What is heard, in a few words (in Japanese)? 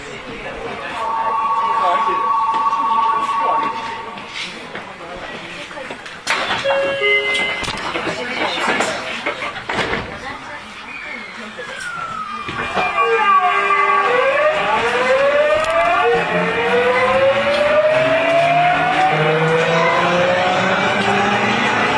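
走行音, 9000系; Tokyu; 祐天寺-中目黒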